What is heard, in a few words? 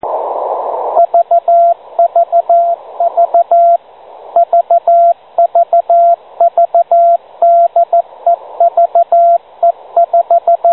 beacon, VHF Radio Aurora